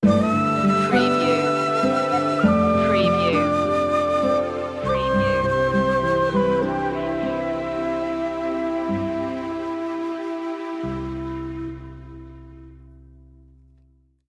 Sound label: japanese garden sting